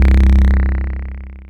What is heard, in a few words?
Rippah, Analog Bass; Ableton; Factory Packs; Retro Synths; Analog Bass Vol 1